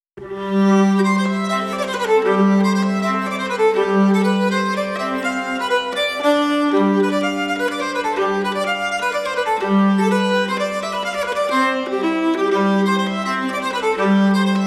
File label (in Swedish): Polska i G-dur; efter August Bolin